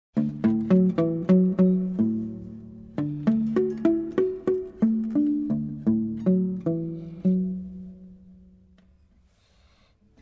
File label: Afro Cuban